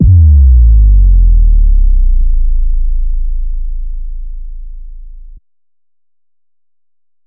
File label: Sub Drop